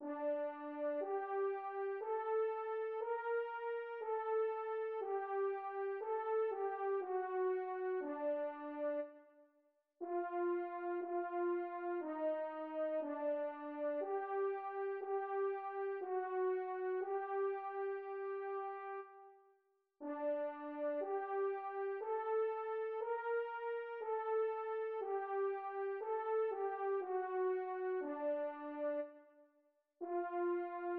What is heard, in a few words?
midi